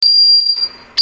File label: dog